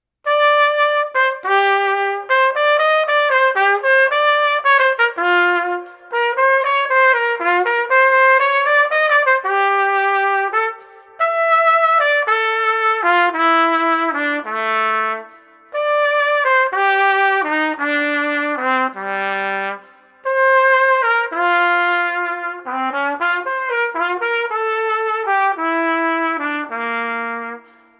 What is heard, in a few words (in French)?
trompette